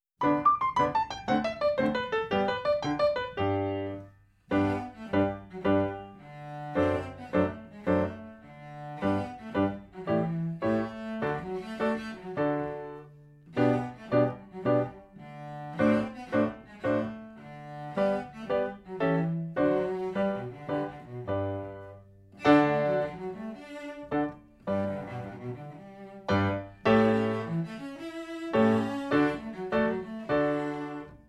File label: Piano; Suzuki Cello Vol 2; Witches Dance